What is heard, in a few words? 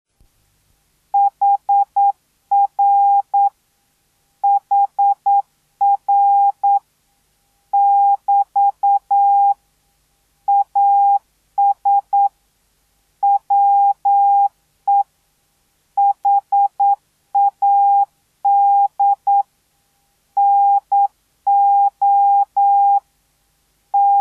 MORSE